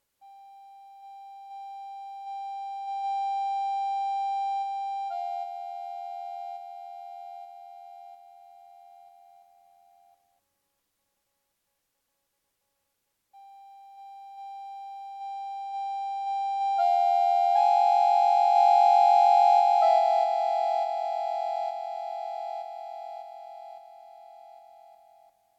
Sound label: reed response sampler